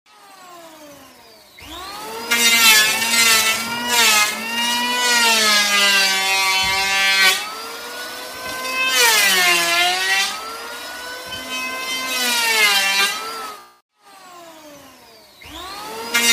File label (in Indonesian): nada dering alarm ketam kayu; Suara mesin kayu